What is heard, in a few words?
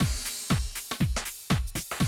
Classic Drum Loops